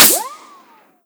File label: ricochet; arccw